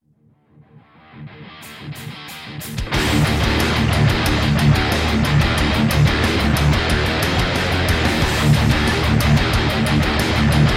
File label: Metal